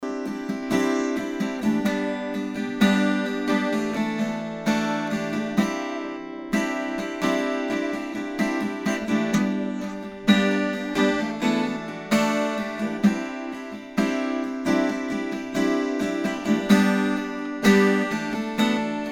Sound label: accGTR